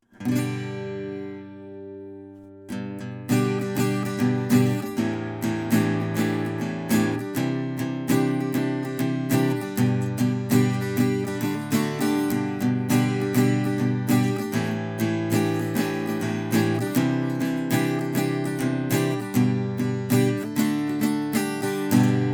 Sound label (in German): a guit m5p korpus